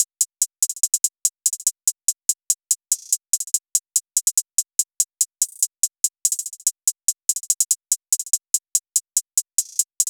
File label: Hihat Loop - 144 BPM, Hip Hop; Often